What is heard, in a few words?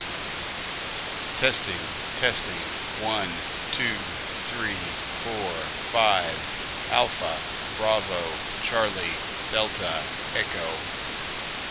analog snr=+15; freedv